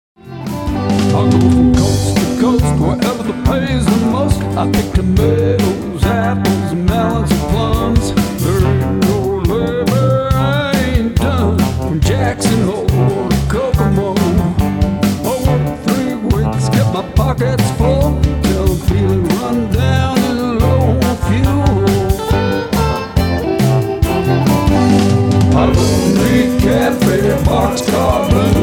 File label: Box Car Blues